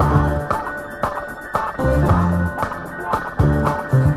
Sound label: strings; Phonky